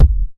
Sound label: tight low end steel kick drum sound f key